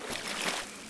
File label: wade